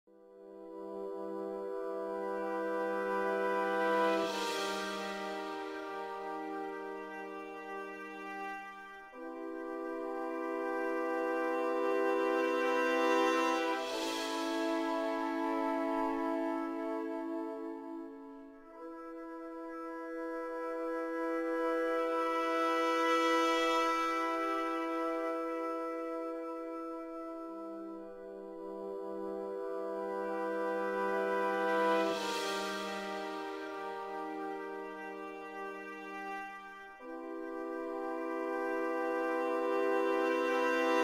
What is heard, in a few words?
Loading Screen; The Amazing Spider-Man 2